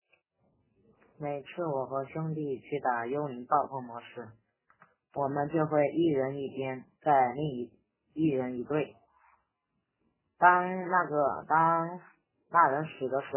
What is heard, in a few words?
haha